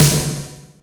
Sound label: SR Snare; Yamaha PSR E305